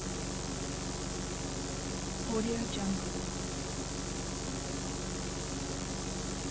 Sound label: scifi mining beam loop